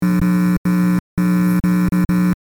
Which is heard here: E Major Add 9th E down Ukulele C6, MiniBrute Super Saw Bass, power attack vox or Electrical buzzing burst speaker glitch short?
Electrical buzzing burst speaker glitch short